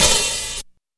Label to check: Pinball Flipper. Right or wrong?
Wrong